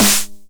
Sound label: 909 SD Tape Sat